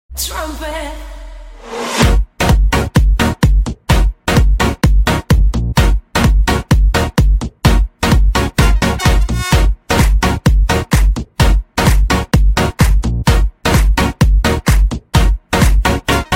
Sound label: Trumpet